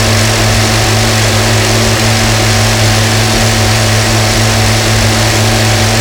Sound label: BMP engine max; DCS